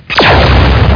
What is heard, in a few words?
laser; Macross